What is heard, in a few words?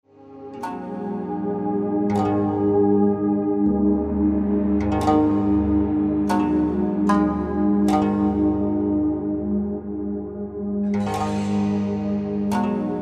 zen far east sounds